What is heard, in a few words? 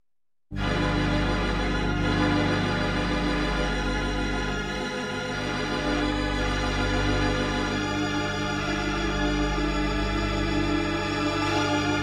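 sounds with effect of completion, ending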